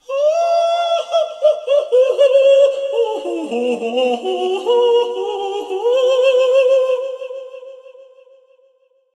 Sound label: Ohhhhohohoho!; Toby Fox; DELTARUNE Chapter 2